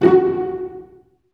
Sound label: STR SEC, STRINGS; Roland - LA Composer vol. 1